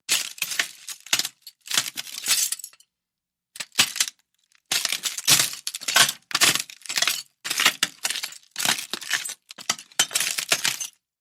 Walking on glass

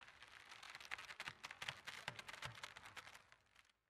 rope

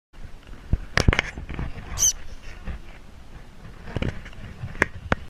Moles

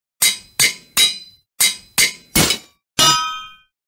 Anvil

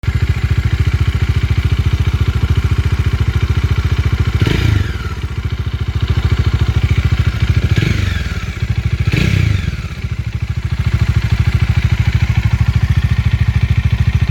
Bajaj dominar

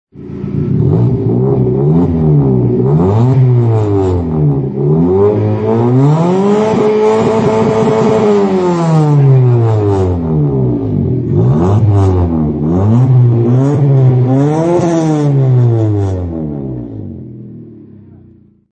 Exhaust silencer

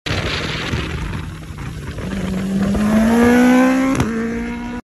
kawasaki ninja zx

